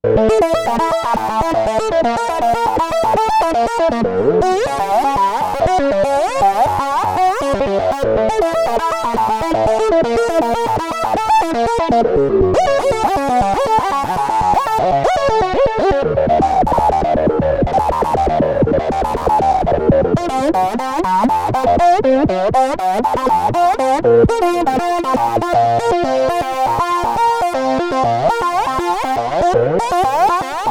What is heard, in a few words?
feedback